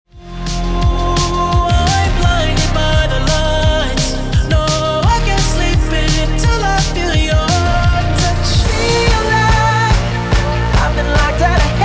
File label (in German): instore audio; echion